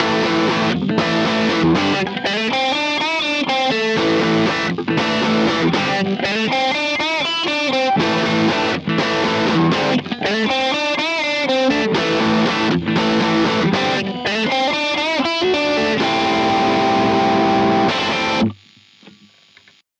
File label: Two Stone Lead